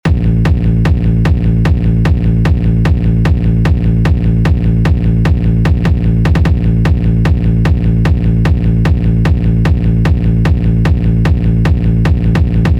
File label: Gloomy Raid, hard techno kick; hard techno kick generator